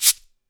PERCUSSION; Sonic Reality; Interactive Drum Kits; 90s sample cds

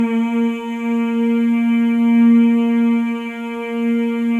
MALE MMS, Symphony of Voices; Spectrasonics

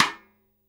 Kit Rim, Drums Acoustic; USB Soundscan vol.10; AKAI; 90s sample CD

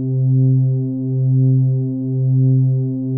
ANAPAD C3, ANALOG PAD; Music Store - Claudius Diemeter Schwerlos

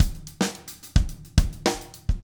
CONGA

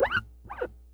SCRATCH, Bass; EFX SCRATCHS

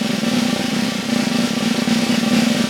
Vintage Snare Drum; Q Up Arts; Johnny.C's Vintage Snare Drum

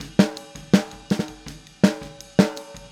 Boogaloo Chorus Snare, 82 BPM

